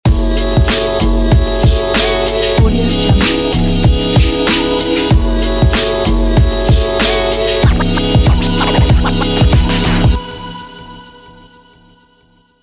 for podcast logo

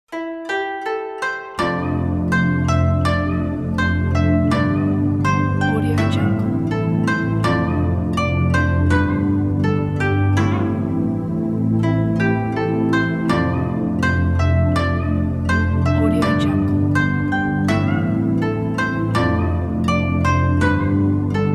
inspirational japanese koto

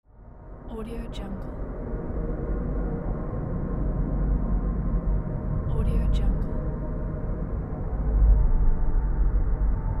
space ambience

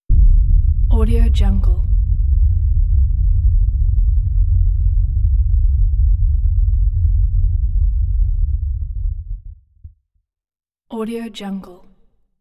deep low explosion impact

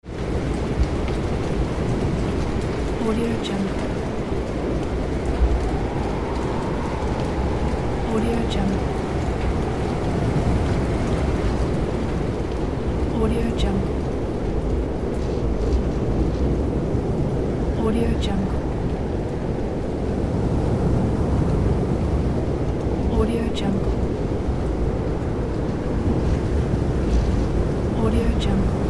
storm wind

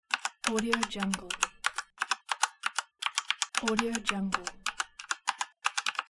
keyboard typing text

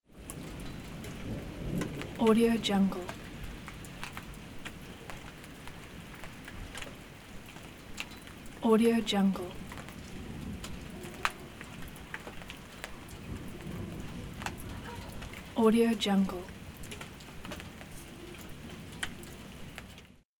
soft rain

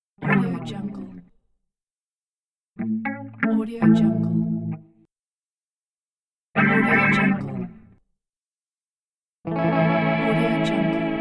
guitar

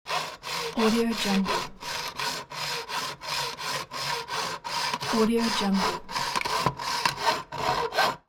hacksaw cutting metal

